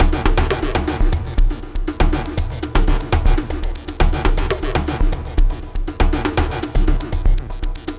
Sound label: Quantum, Processed Percussion